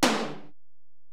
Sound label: Tom, Alesis DM-PRO